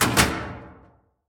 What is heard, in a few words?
Stickie Reject; Prison Architect